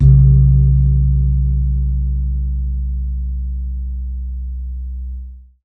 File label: gamelan gong; SDIF; ICMC2000